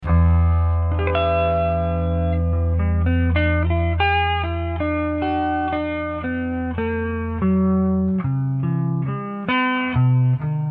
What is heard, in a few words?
low mood pickings